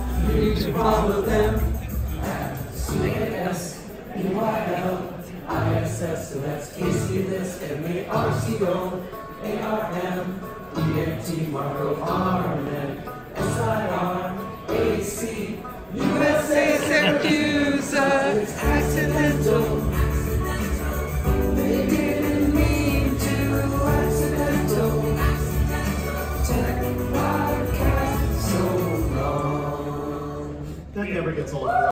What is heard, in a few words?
audience sings theme song; atp